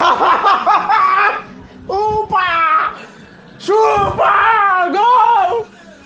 hahaha boy

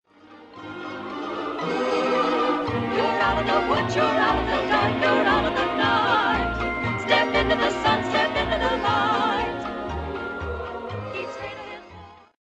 optimistic voices

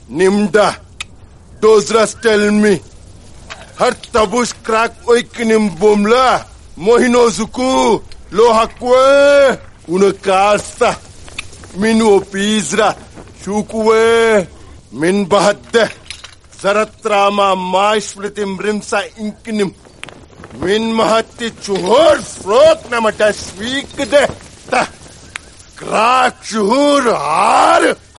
rap

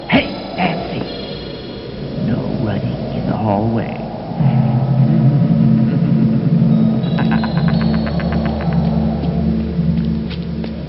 running

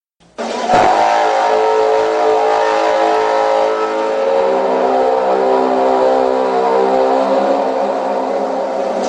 scariest sound ever

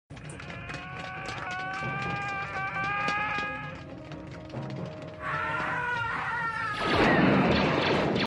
run; han solo

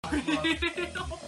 laughing

